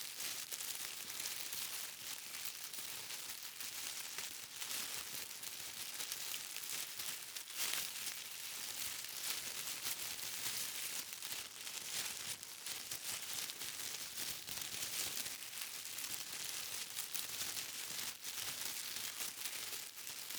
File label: Fern Movement Loop; Player; Entity Contact Movement